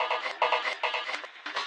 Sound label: voxuguy, vocal or voxy loops